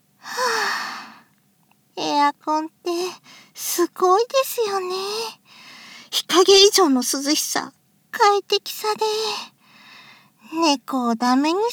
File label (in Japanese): 炭酸